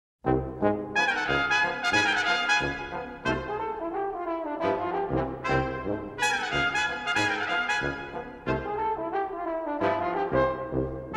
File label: Brass Ensembles